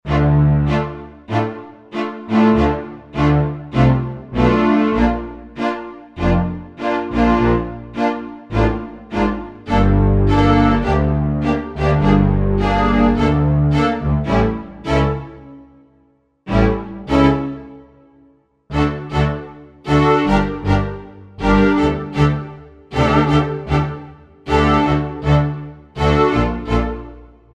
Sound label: Cello Ensemble, Korg Wavestation A-D